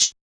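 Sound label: Hi-Hat Metal Closed; Yamaha DJX-2B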